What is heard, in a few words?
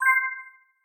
system decide; LOOPERS